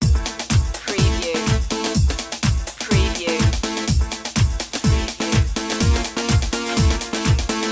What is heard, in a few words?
dance loop f